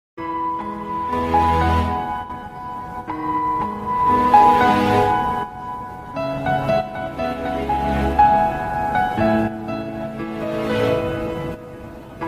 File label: adithya varma love bgm south love ringtones